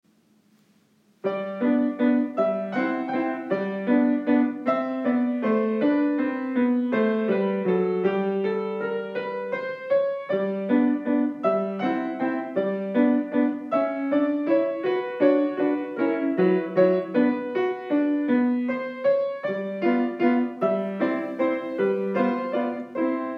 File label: waltz in g